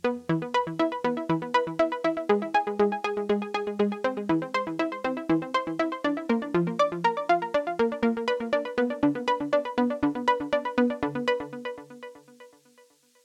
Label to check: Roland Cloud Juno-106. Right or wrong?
Right